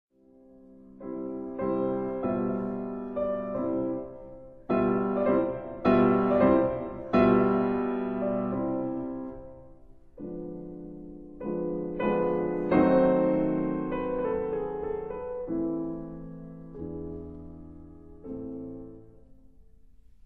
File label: Sonata for violin & piano in A major, Adante Piu Tosto; Op. 12; Allegretto